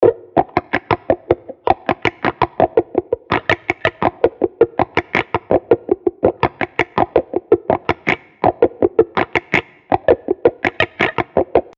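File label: gtr, guitar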